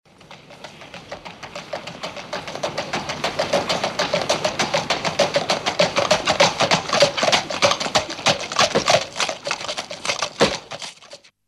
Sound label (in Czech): prijezd kone